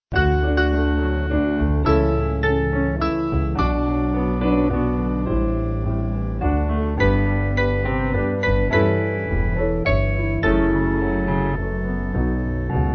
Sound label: River Wash Over Piano